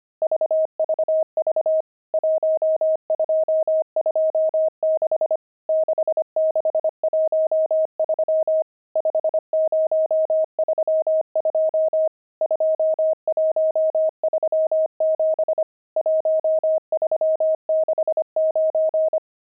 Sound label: pangram, intermediate pangrams files